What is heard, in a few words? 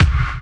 Kicks; Hangem Bangem; Loopmasters; Jon Carter & Alex Blanco; Block Party Essentials; Drum Hits